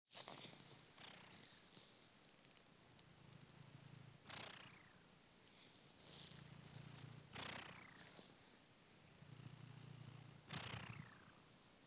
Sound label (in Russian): zvuk sopeniya koshki